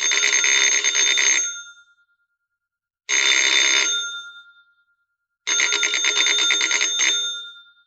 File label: phone ring old timey